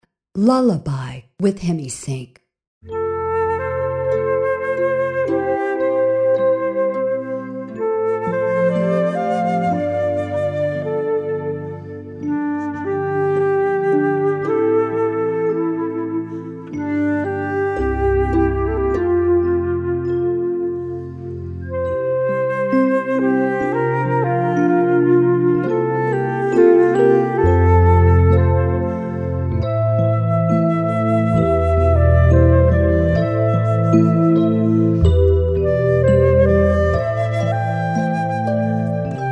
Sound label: Lullaby